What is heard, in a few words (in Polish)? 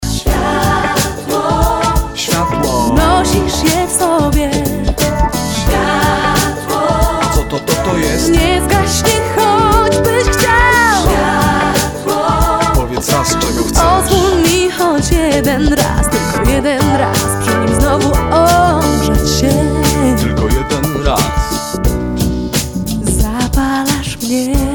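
rap remix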